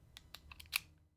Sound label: Revolver Hammer Down; SoundsCrate